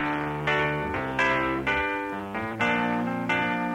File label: keys, phonky loops